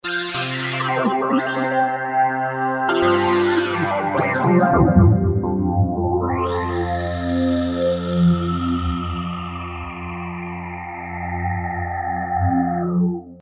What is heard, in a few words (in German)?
Filter Raster